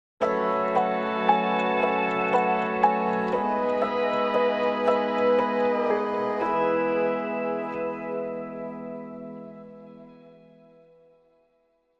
Sting 1 Acoustic Ver

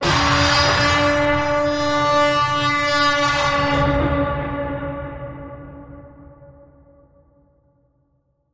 Bend, C sharp

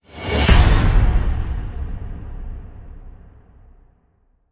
Hit Insertion

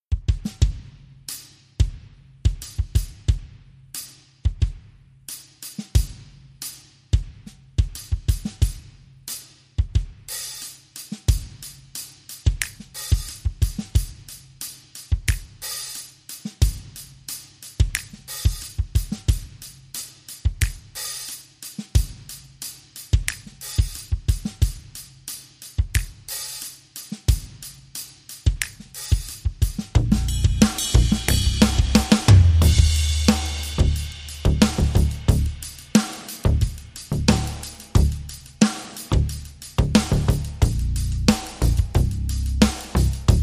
Drum n Bass

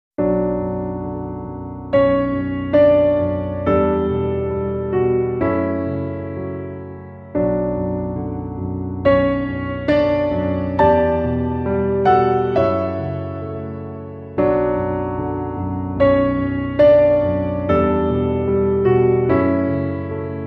Piano Only

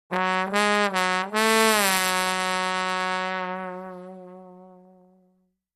Trombone Just My Luck

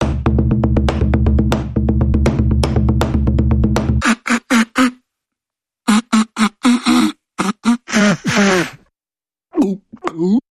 Percussion Bumper Reversed

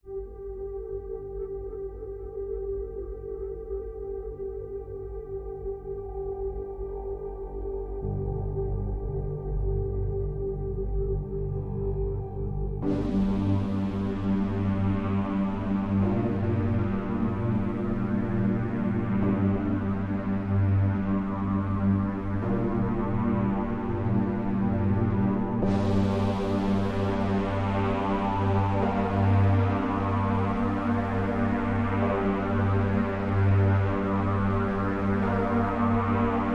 Drone, Light

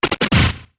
Short Sputter

